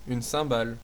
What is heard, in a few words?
cymbal crash; percussion; music; tuxpaint-stamps